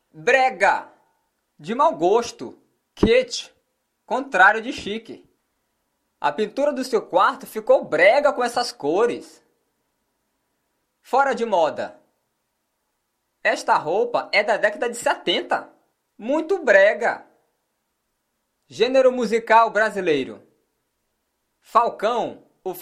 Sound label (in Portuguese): brega